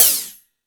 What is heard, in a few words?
Hip House, drum machine